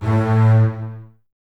BSS, Basses, String Ensembles; Miroslav Vitous; LINKS ORCH

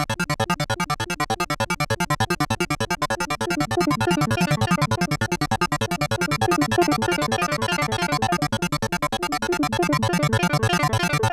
TEKNO, Hyper Dance, FX; Best Service

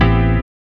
SYN Fix Chord; Best Service Gigapack 1

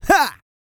GRUNT, MALE HUMAN; ILIO; Vocal Planet

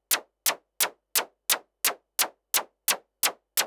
Modular 130BPM

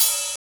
OP HAT; East West; Funky Ass Loops

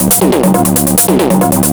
drum, 138, drum-loops, Techno; Techno ID; fatboom

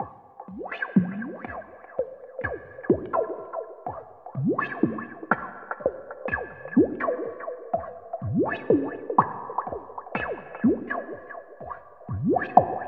FILTA BUBBLES; Zero G; Malice In Wonderland

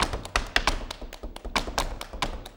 STEP + CLAPS, MSTEPS+CLAPS

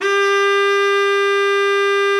BARITON, BARITON SAX

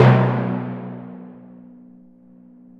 Hit Hrd, Drum Machines, E-MU Proteus-2000

